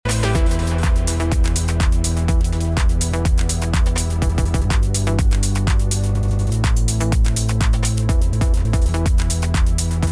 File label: Pioneer DJM A9 Triplet Roll